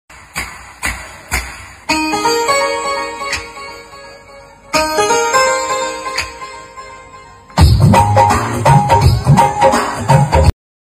call ringtone hindi ringtone love ringtone song mobile ringtone